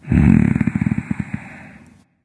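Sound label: nonverbal content; smite; voicepack; ullr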